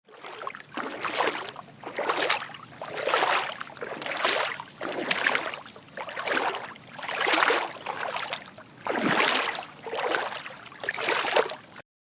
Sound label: walking in water